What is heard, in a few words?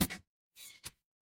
ui modal